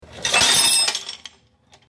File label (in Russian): yashik s instrumentami